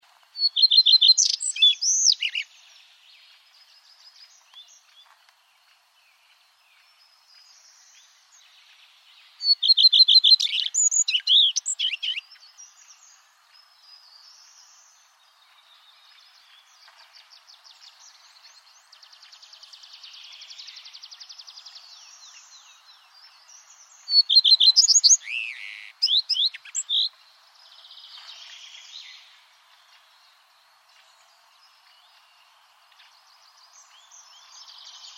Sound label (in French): Rouge queue a front blanc, chant